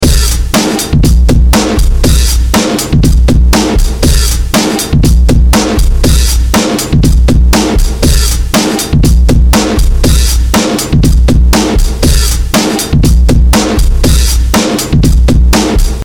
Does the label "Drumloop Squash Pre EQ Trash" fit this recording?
Yes